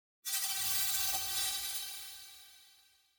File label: Granular Feedback Tone Thin; Hollywood Edge; sound designer's toolkit v2